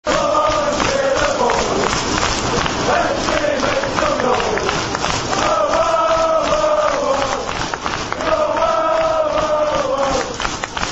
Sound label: cheer up the boys